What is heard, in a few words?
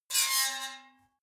metal squeal bright fast; metall